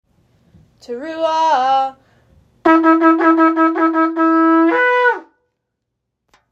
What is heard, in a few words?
teruah